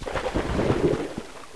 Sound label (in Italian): onda; Interactive Media Design Review 1999